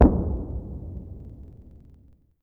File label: distant gun 105mm howitser; WAR